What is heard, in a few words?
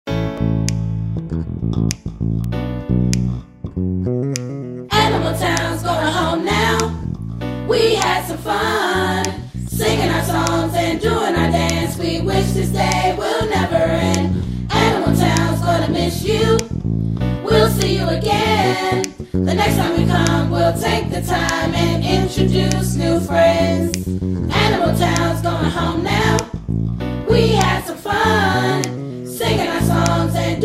goodbye song; Aniimal Town